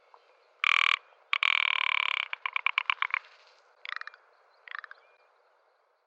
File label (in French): lagopède alpin